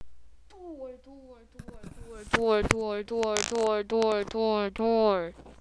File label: door